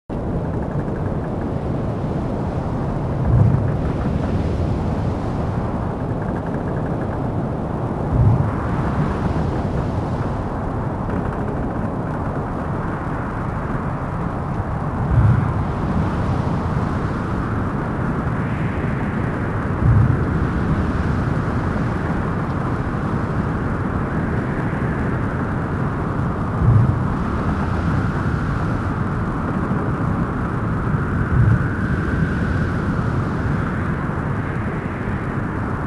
SFX Amb Belowdeck; Family Guy Back to the Multiverse

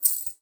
Coins

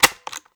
Button; robobeat

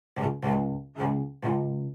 Cello; Arc Apellago

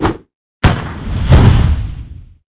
barrel; hero blackthorne

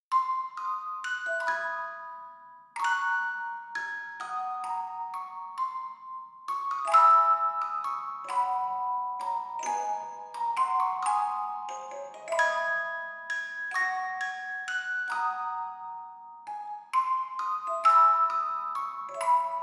Cadena ～ on orgel

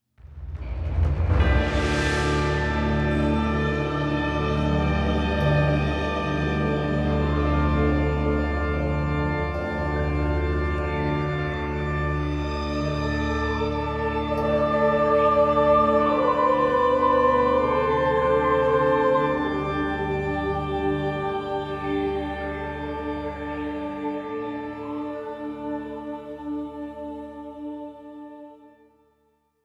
74BPM; MetebelisTempleEnter

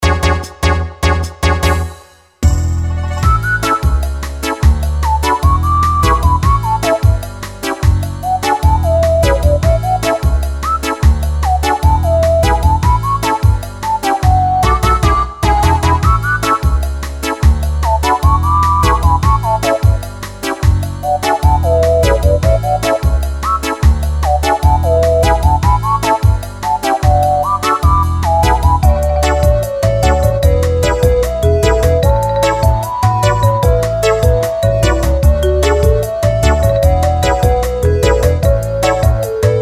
Interior Shop; Nexomon

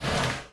plat appear

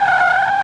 Brake

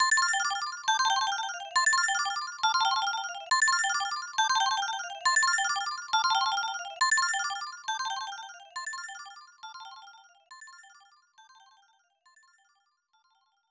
Dream Chimes; puzzle bobble

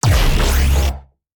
Low Designed Bass Canon; Robobeat

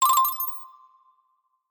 Collect Bonus Token; Donkey Kong 64

